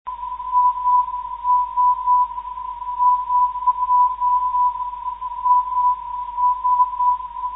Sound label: 396.96 kHz